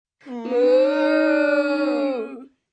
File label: Group Cow Moo, Imitation, 3 children, group, imitating cows and saying 'moo', kid, human voice; Exclamations; VoiceBox